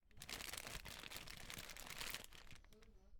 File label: Paper Scrampling